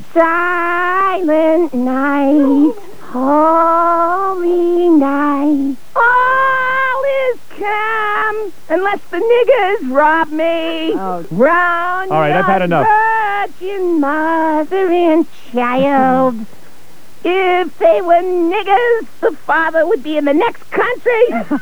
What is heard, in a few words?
shot